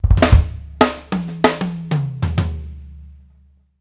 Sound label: TOM SET UP L, Drumworks FILLS 96; Sampleheads - New York City Drumworks VOL-1